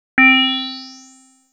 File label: metal beat, Synth